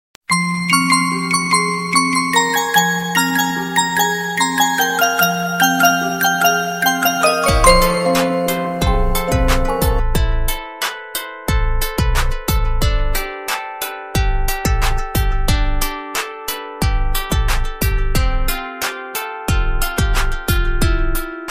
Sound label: best soft tone